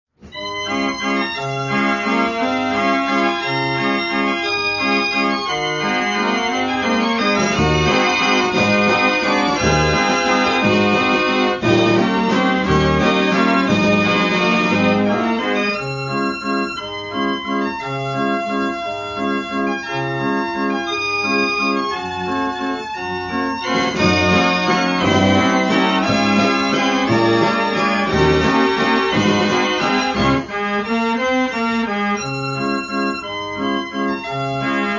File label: Waltz